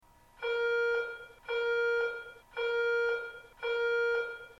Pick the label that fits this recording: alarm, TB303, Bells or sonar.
alarm